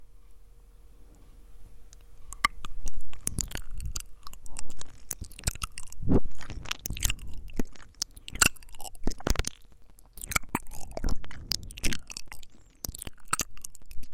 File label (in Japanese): 耳舐め